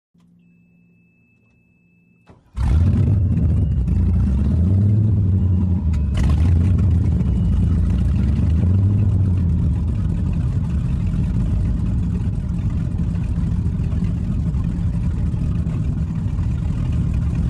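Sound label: Power Boat Interior Start Away, off shore power boat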